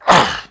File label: pain; quake2; redux; quakeguy